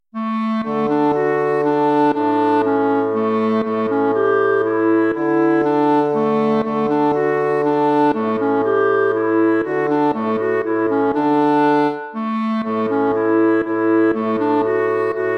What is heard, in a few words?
waltz; Ferdinando Carulli